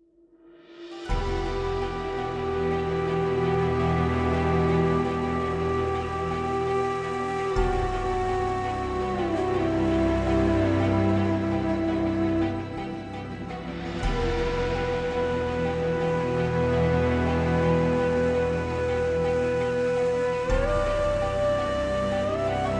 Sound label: The Sheperds Flute